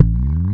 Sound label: BEL2; USB Soundscan vol.32; AKAI